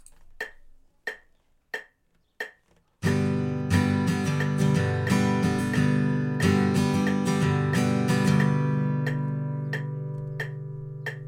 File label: 90bpm, fingerstyle guitare